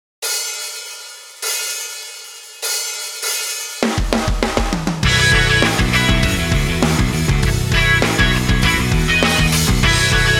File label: karaoke